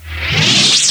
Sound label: LightSaber in; Apache; Slide Sounds